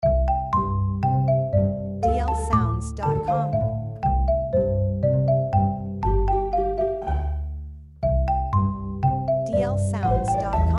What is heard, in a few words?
Mischief Loop